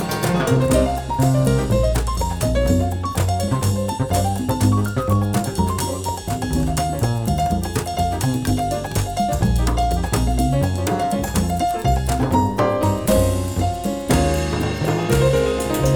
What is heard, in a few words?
eq test